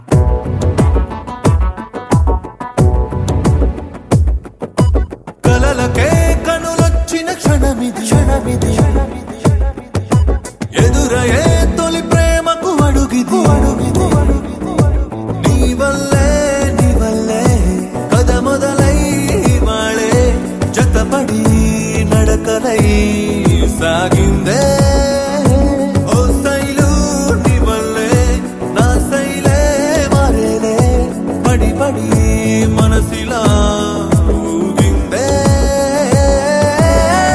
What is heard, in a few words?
Telugu